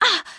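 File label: moan, female; Interactions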